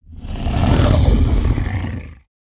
idle, creatures organic

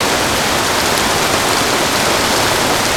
Jungle Waterfall Med; ambience gnarled forest

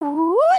breakdance oooooop; emotes

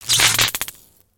spark; ambience spaceship

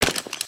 Legos Hit Ground

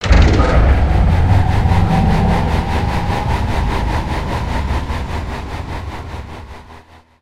Big Fan Power Up; ambience avant gardens

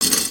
chain; creatures organic

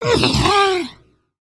Idle, creatures organic

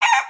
cluck; creatures organic

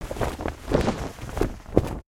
Flag Flap; ambience ninjago

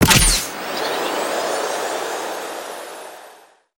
rocket build chunk

